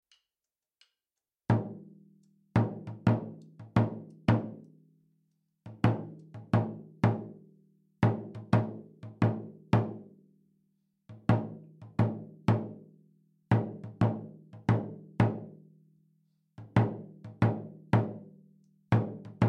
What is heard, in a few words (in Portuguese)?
alfaia; 16 barra